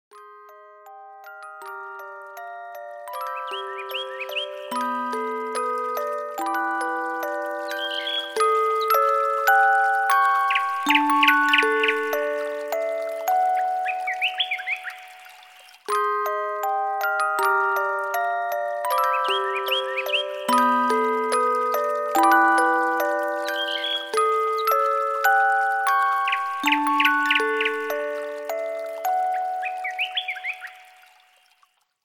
Alarm; Unihertz; Jelly-Star; alarms

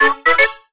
Nada alert; Sony Ericsson; PlusFourSix; SE Sound Project; Lars Edvall

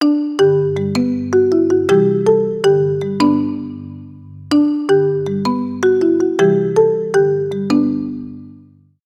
Wooden, ringtones; China Telecom

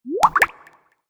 Dewdrop, notifications; LG G Vista VS880

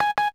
Beep Stop, camera video rec sound; SKY; IM-U450L; System Sounds

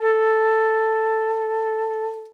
Low Battery; Vertu; Signature Touch

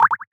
Watery, Natural Elements, notifications; Google; Google Sounds

